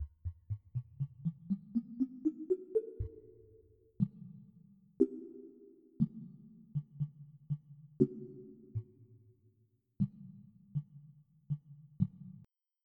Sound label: Sine Chase; FF7